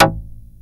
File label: TURBO ACID